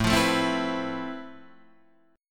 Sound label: F Major Sharp 11th A